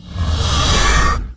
Pickups

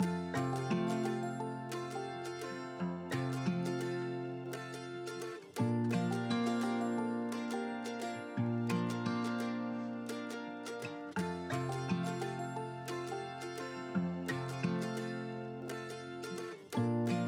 Music Start; My Little Pony World; android

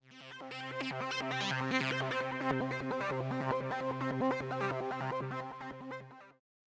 Racer Menu Music; Bank Limit: Advanced Battle Racing

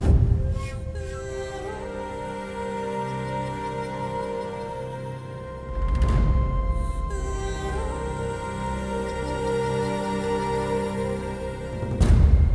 Circle Percussion; Horizon Zero Dawn

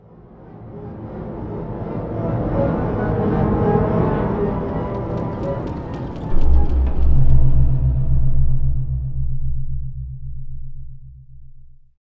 amb strings; gamerip; blackfaun

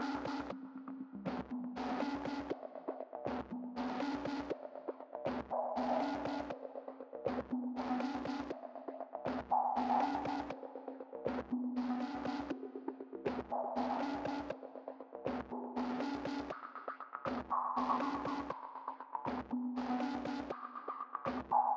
Phoning Beat; Get Even; Handler

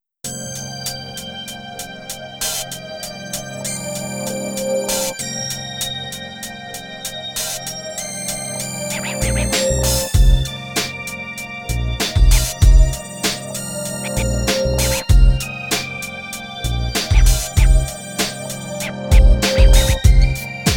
instrumental; beatmania 2nd MIX 1998

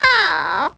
SFX Cupid Drop

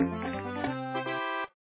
Customer Happy; papas cupcakeria online windows gamerip 2013

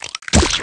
Death Blade

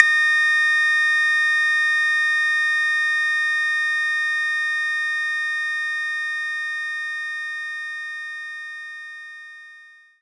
TR 808 Cowbell; knights try

